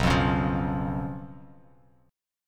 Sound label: B Suspended 2nd Flat 5th B Bass Standard; strummed; JGuitar